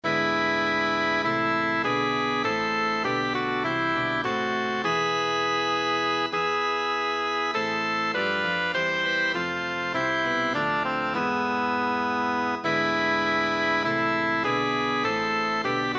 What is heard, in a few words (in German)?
choral alt; 06 choral; bach johann sebastian; kantate bwv 038 aus tiefer not